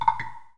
logon; zoc400wg

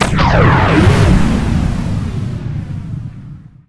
Fusion Bomb; Phoenix

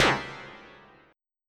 drum

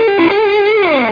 riff

